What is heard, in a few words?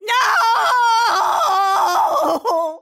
death; snapfire